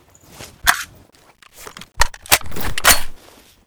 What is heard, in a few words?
hk416 reload empty